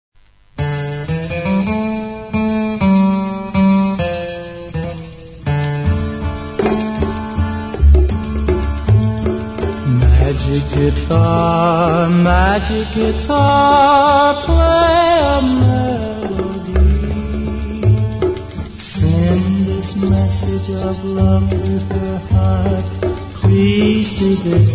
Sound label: magic guitar